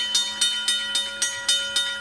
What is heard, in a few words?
jetski; giants